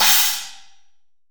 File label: C. C MUTE, Drum Kit, AMBIENCE; AKAI S6000 CD-ROM - Volume 3; AMBIENCE KIT2